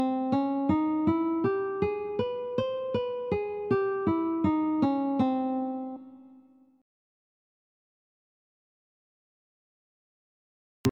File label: Playing Scales; betrayal at krondor